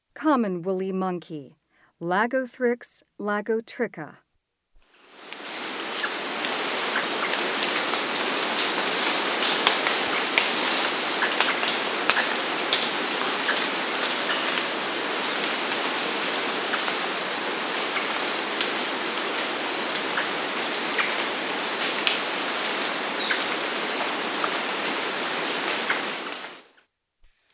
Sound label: Common woolly monkey, Primates of the Rainforest; S. America